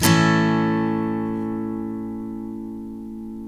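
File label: steel A major up, CHORDS STEEL, Guitars; Spectrasonics; Hans Zimmer Guitars VOL-1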